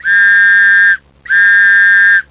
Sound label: Whistle; Working Model Program